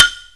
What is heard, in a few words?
HI BLOCK, TECHNO INDUS; Greytsounds VOL-1